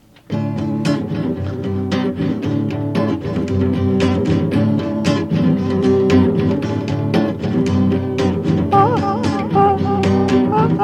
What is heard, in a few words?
rain night solo